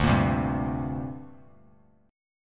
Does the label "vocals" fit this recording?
No